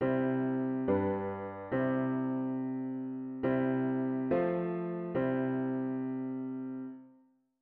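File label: diatonic root with key bass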